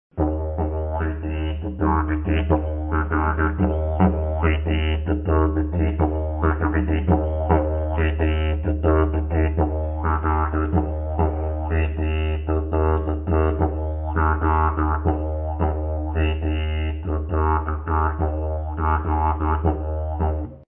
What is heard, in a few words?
Swing